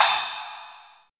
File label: crash cymbal f sharp key, Royality free crash tuned to the F# note